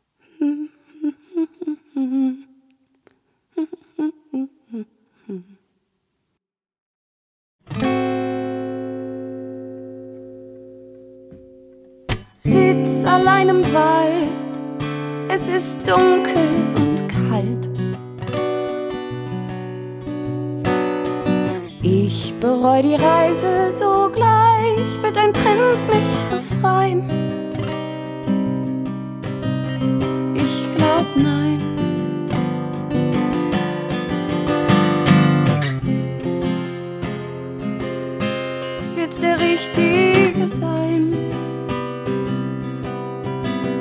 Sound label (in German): lied